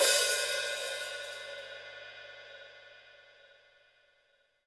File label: HiHat & Crash, Roland TD-20; HiHats